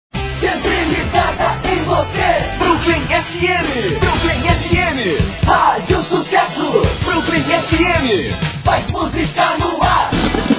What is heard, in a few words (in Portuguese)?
vinhetas popular